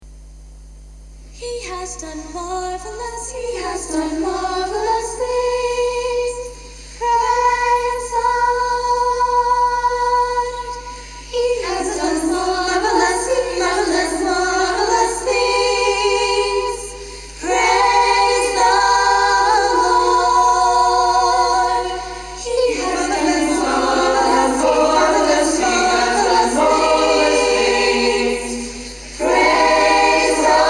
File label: music english